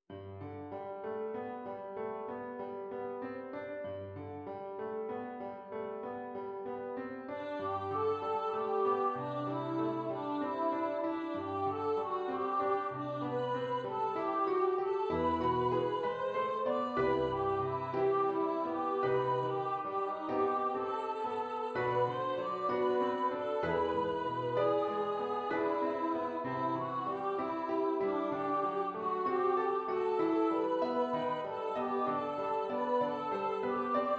Your Prize PIANO

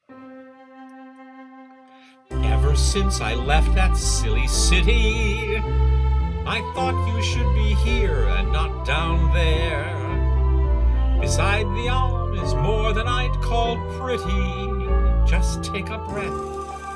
So Good vocal; HEIDI – A New Family Musical

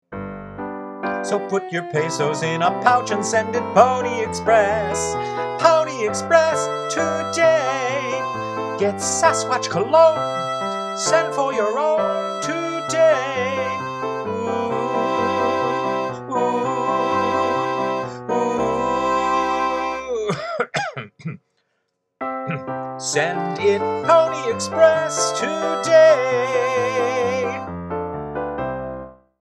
Jingle; Pony Express; The Saga of Sasquatch Clone; Doc Holliday's Electronic Medicine Show

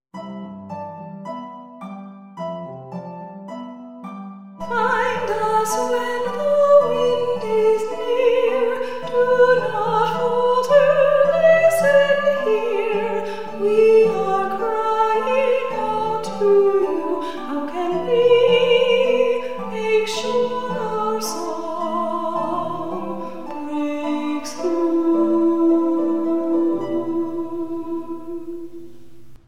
Song of the Lost Children vocal

